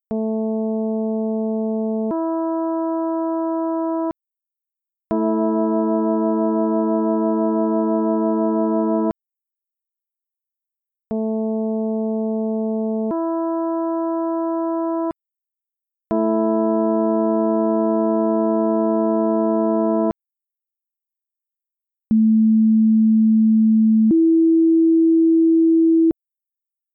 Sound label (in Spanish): quintas